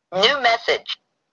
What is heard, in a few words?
new message; sidekick